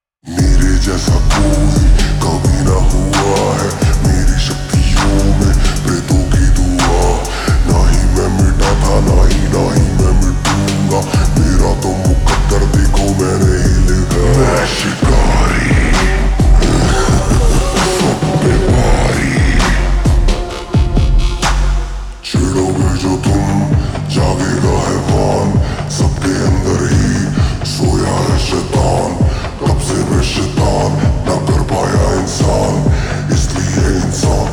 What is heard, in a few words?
Ringtone Slowed Reverb